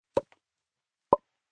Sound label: Lips Popping, Mouth effects, 1 child, double pop, human voice, kid